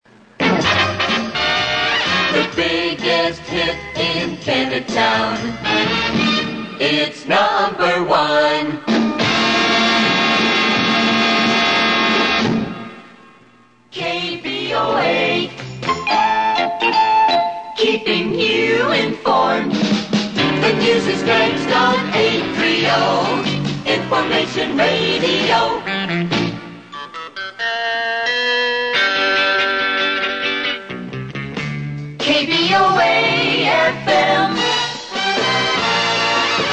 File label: jingles